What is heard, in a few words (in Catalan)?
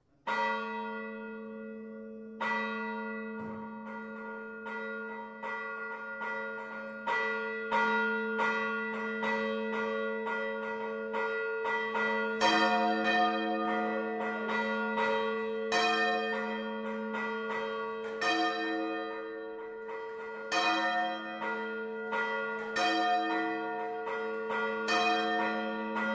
campana